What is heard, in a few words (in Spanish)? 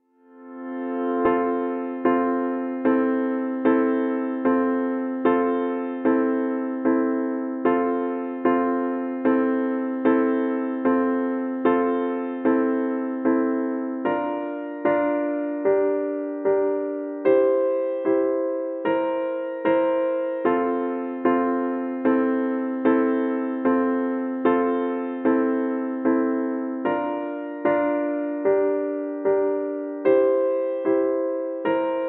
Instrumental